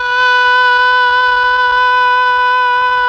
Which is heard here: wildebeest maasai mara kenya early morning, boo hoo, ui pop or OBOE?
OBOE